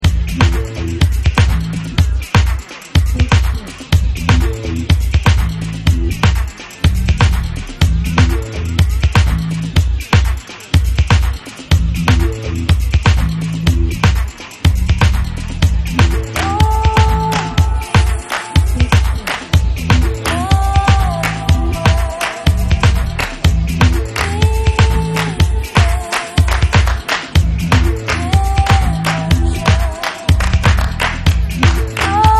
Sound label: electronic